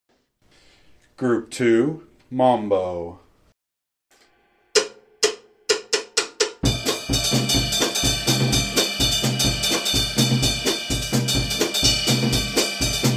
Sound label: mambo